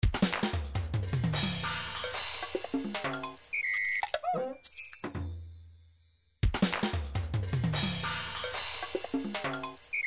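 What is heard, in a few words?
wavedrum conga, midi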